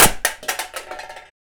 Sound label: Drop Plate; FREE SFX PACK VOL 3